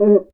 CUICA; ILIO

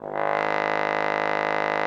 trombone G#1; Xsample VOL-3

